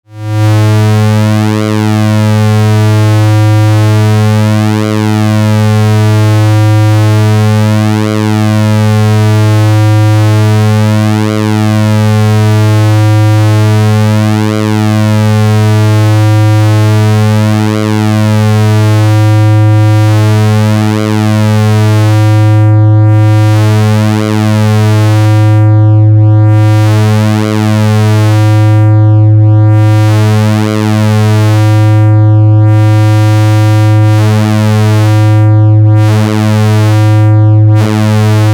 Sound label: 200e PWM, Buchla